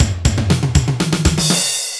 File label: room fill